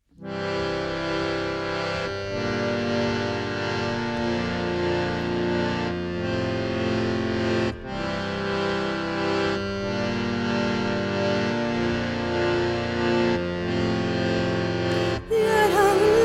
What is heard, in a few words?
Om Mani Padme Hum